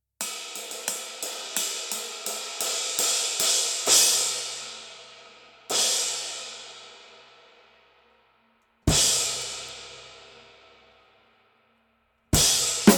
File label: Extra Dry Thin Crash